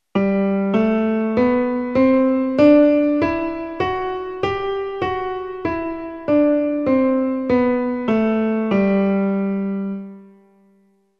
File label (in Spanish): sol mayor grave